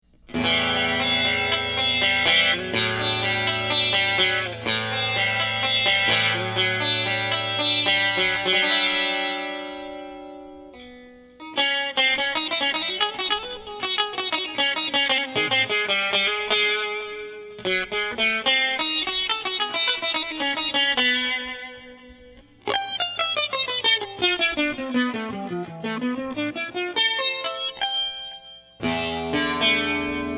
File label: SITAR